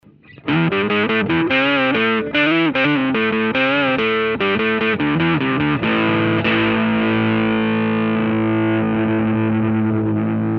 Sub octave fuzz

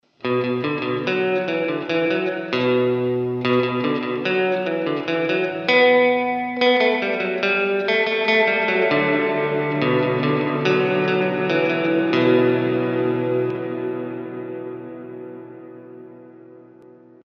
Plate

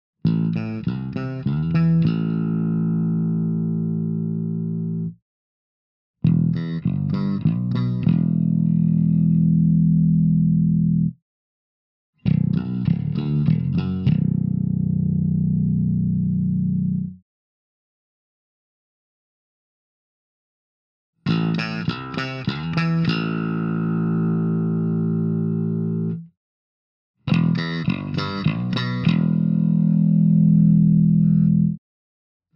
Washburn RB 2500 Custom Modified Fingers; EQ-no boost; EQ-High boost; EQ-HighLow boost

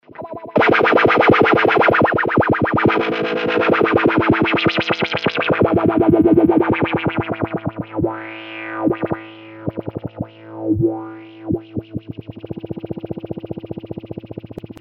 Throbber

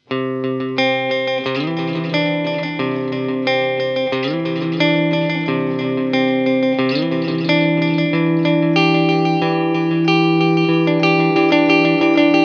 Stereo delay

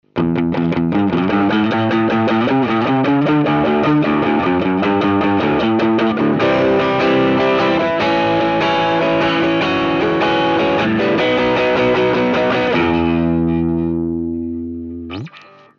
Screamer

